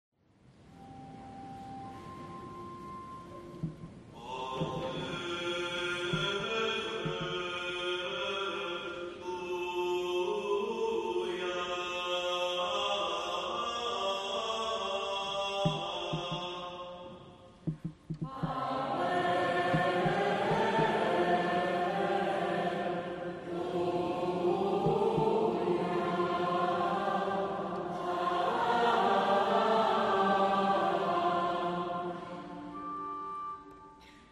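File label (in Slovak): Chrámový zbor, Aleluja, slávnostné trojité zvolanie; GAUDIUM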